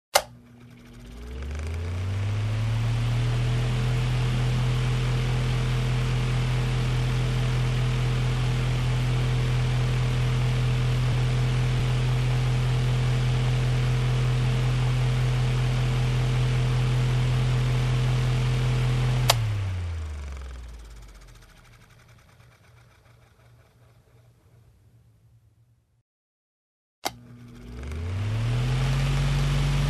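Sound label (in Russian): zvuk ventiljatora, fan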